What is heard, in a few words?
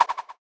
bee hit floor throw; crocs